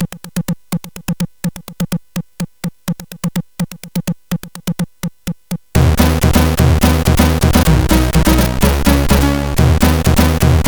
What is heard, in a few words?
Techno, sid CSG8580R5; hvsc